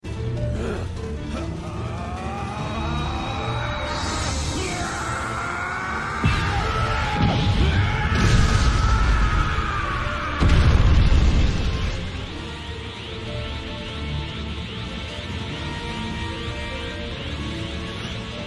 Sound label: screamming; vegeta